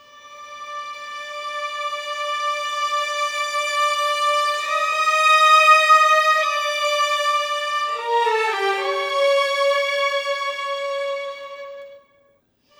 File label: Violins, Cinematic; Sonic Foundry (Sony Creative Software); Orchestral Series VOL-3 - Cinematic